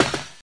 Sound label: wire; Dino Crisis; door